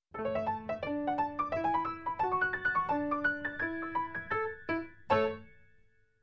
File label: Happy Piano Transition